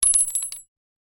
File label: cart